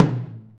mid tom drum single hit b key, tom tuned to the B note. Loudest frequency: 425Hz